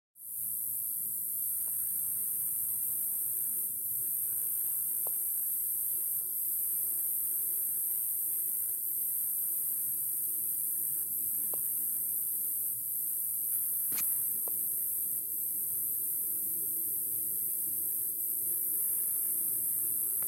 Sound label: crickets mosquitos